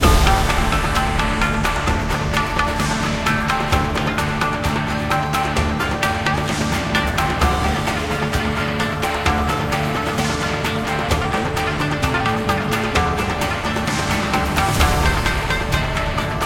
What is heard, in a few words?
music fight; naruto ultimate storm android ios gamerip 2016; jinsemingren music level2 fight